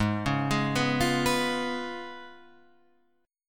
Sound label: Db 7th Ab, guitar